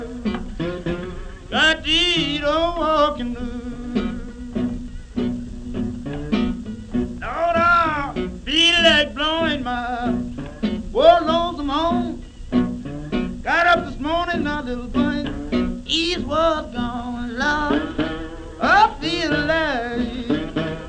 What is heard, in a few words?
blues